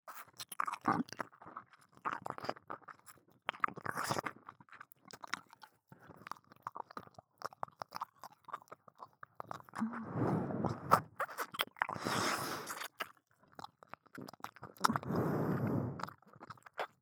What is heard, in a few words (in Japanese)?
左耳舐め, ASMR; アドリブ集